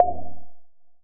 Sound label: space alert, Misc Percussion, Ticks